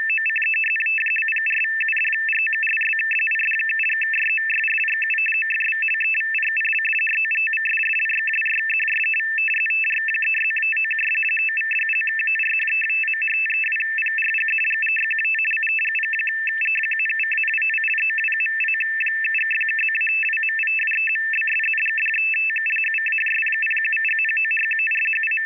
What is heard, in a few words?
FRENCH NAVY 50Bd/850Hz CRYPTO FSK SYSTEM